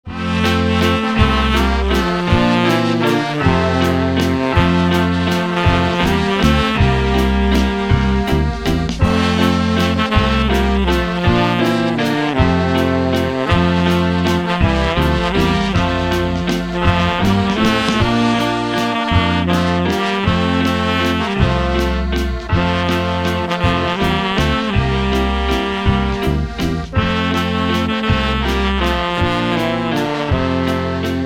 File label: Waltz